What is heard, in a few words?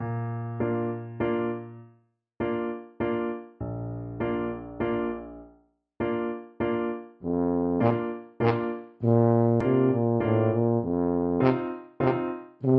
saint saens elephant TUBA